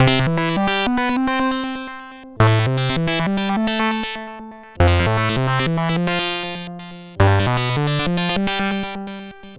C64 Synth; 8-Bit; Chiptune; Commodore 64

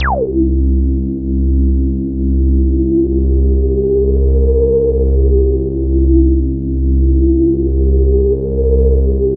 SFX, synth atmos & fx; Dream Zone

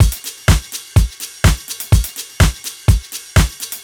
house full, 125 Beats

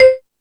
Percussion, Drum Machines E-MU Pro-Cussion

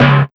Ragga & Dub Reggae; USB Soundscan vol.27; AKAI

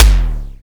SDS KICK F2; Greytsounds VOL-1; SDX KICKS

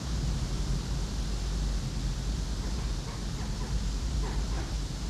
Ambience Loop, outdoor

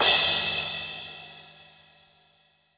Crash, Cymbals; Roland BK-5

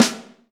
LUDWIG SN, Ensoniq ZR-76; 300 Drum Machines

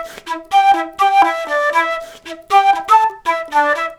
flute, disco; Best Service ProSamples vol.48 - Disco Fever; AKAI

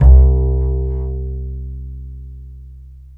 BASS, Drum & Bass, 150 BPM; Ueberschall; Drum & Bass Flash Trackz

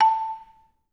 MR LONG A5, Advanced Orchestra; Best Service

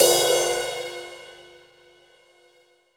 New Brush Crash, Cymbals; Roland BK-5

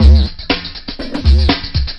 bongpants, breaks breakcore

